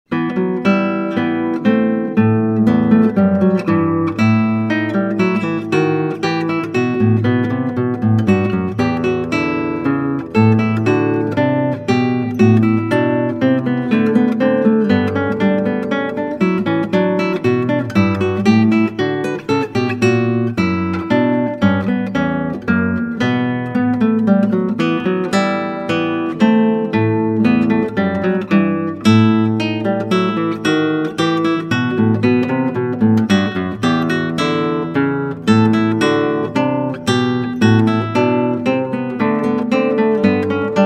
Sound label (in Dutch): Klassieke Gitaar; Bach 50 Solos